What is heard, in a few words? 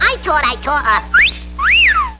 wolfwhistle; tweety & sylvester